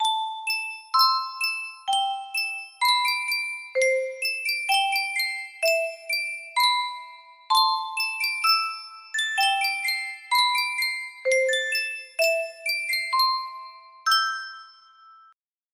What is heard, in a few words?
sankyo custom tune music box